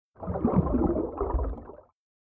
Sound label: fish movement midlow end burst bubbles small; prosoundfx